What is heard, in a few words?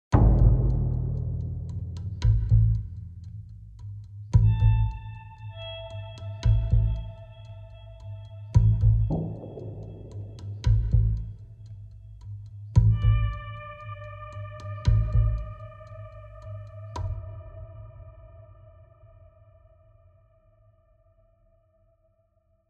Tension Low with Silence; the last of us